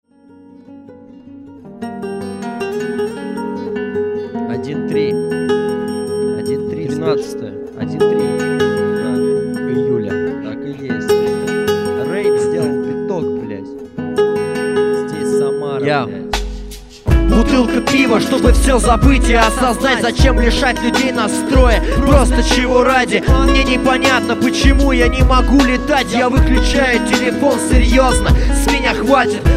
rain beat

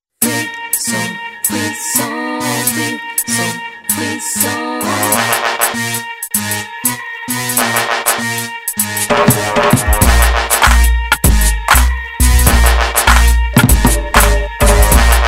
dancehall riddim

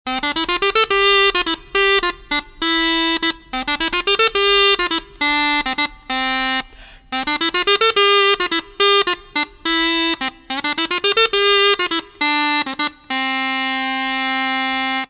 crumhorn

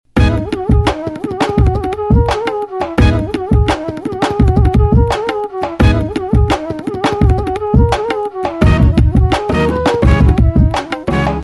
instrumental

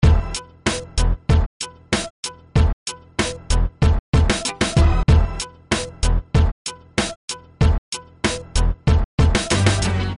basic beat hiphop

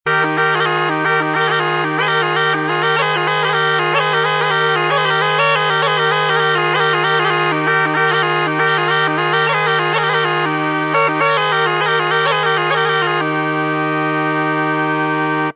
launeddas

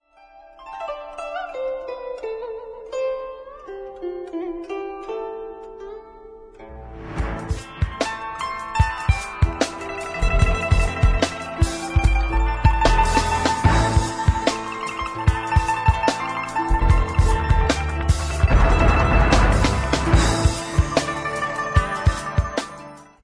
kitajskij jazz

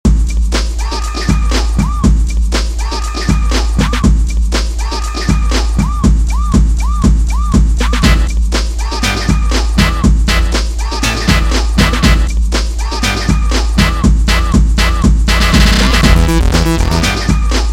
electrobass

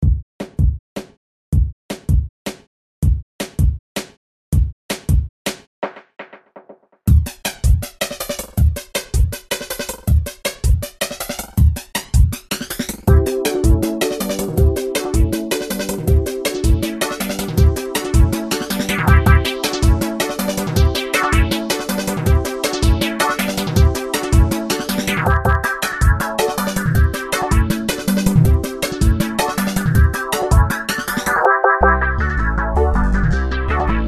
guitar beat

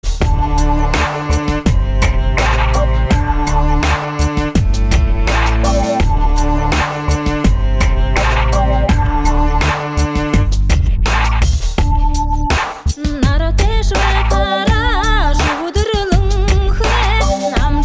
beat

